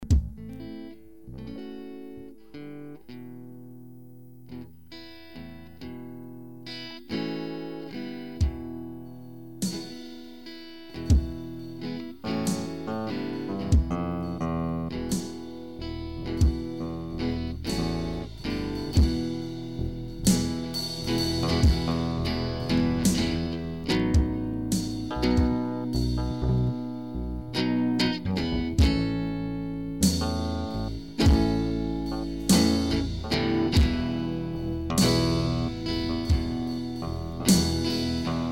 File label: valley blues train